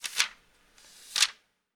turn page, reading book; 1000fx

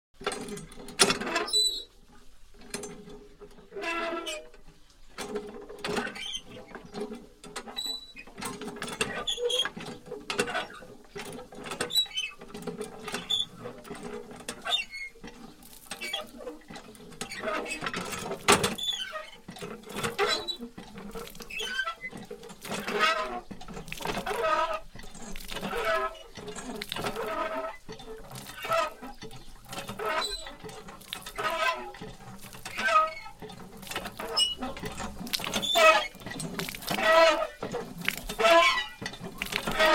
Manual Water Pump; Hollywood Edge Historical Series; Traffic Free BG's & FX

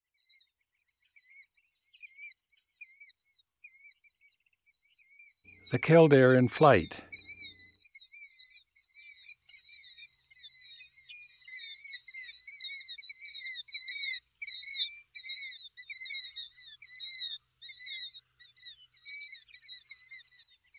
Killdeer; Eastern North America; Dan Gibson; Wildlife Identification By Sound

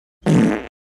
Fart Short Fart Close Perspective, Human Sounds

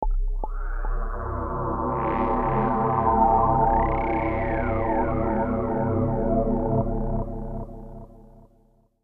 Bass C; Beds

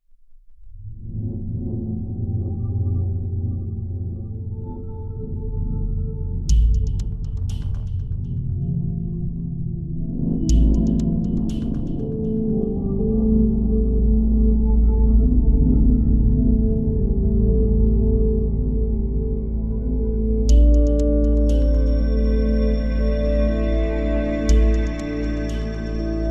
Calm pad; Claire Fitch; Ambiancellist

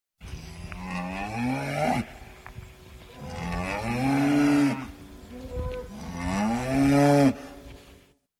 Cows Mooing; SOUND EFFECTS1

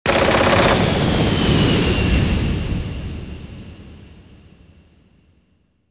Scatter Burst; DJ sounds

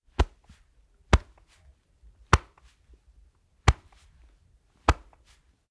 Patting on Chest Cloth Covered Deep Thumps; Foley; Foley Essentials; Foley - Misc

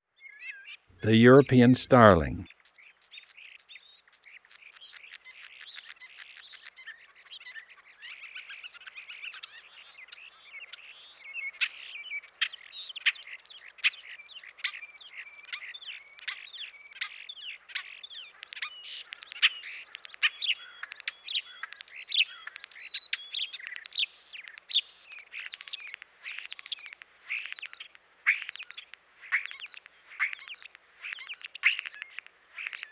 European Starling; Eastern North America; Dan Gibson; Wildlife Identification By Sound

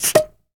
beer, kitchen; 1000fx

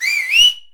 WHISTLE, SMALL CHEER; 1000fx

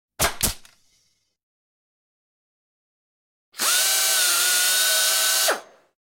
Nail Gun, Air Tools; 100 sound effects

